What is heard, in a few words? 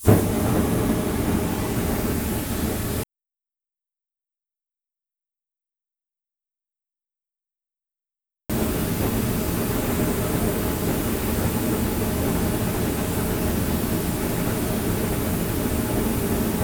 fire; alunizaje